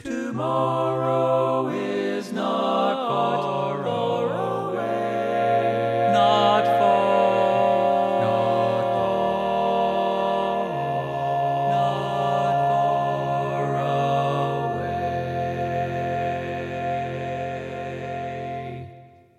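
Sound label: Baritone, barbershop; Tenor hanger version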